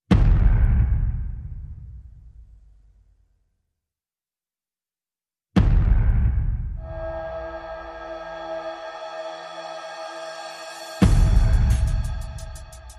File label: Perc and FX